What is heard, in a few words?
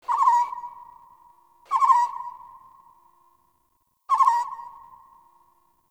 Night Tree Critters; Warframe; Eidolon Plains; Ambience